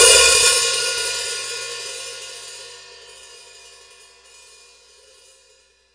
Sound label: HH OP, Drum Dry; East Collexion; Drum 1 Dry